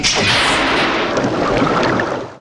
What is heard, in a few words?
Pickup Underwater O2 Tank; Pickups